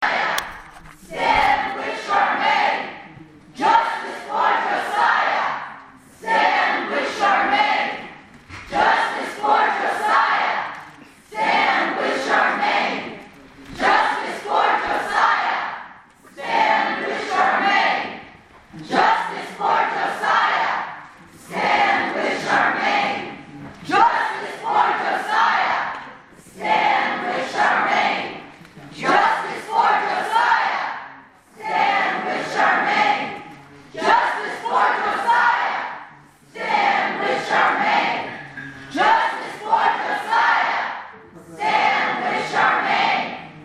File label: Chant